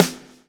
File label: LASH, Backbeat